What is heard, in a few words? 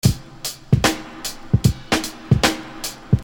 Beat, Hip-Hop Street Beats